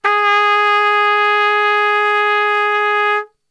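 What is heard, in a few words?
G#2 trumpet straight, brass; Best Service; Brass Super Section; TRP STRGHT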